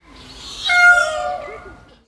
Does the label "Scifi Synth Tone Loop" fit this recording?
No